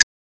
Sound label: hover; NFS; Keiikos NFS Classic Archive